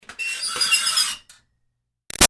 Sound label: Screech Metal Iron Board Piercing; Ironing Board; Materials